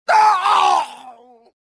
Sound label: death, prisoner